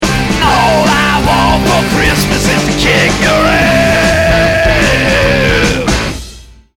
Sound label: round mvp anthem, music; Counter-Strike Global Offensive